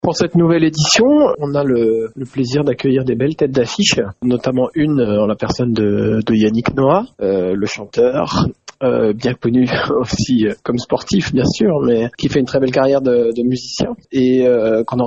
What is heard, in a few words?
brass